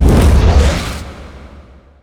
netherblast precast; dota; pugna

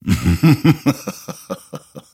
grimstroke laugh; dota

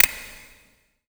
preattack, weapons; dota; muerta

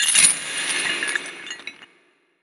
sinister gaze target layer; weapons; dota; lich

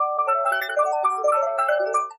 hook cast persona; weapons; dota; pudge

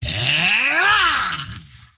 toy pug attack emote; dota; pudge

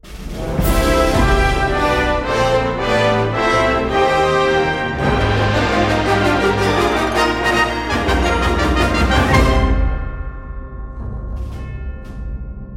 win; monster hunter

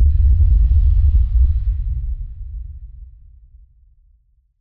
scream; ui; stingers; dt takeover

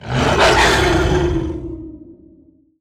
call of the wild boar; weapons; dota; beastmaster

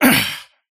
parry fail; dota; kez

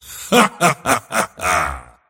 mars laugh; dota

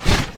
nightstalker movement; physics

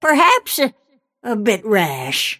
death; snapfire

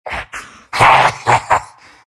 big laugh; dota; undying

